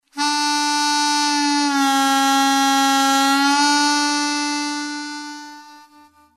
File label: bend, harmonica